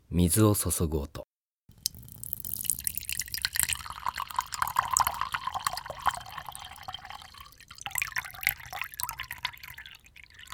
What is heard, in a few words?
cold hot